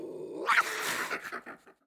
rat hurt

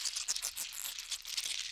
PERCEPTION

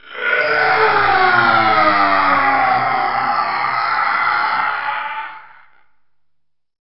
PYROKINESIS TARGET VOICE

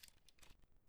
Split Wood

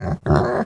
ambient

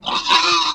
HURT

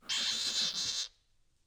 RAT AMBIENT

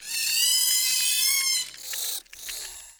DIE

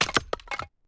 Split Wood

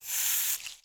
ambient

